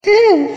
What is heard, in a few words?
uhhhhhhhhhhhhhhhhhhhhhh